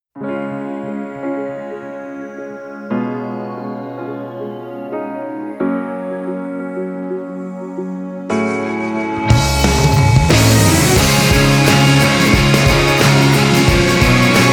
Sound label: off Vocal; steins gate; psp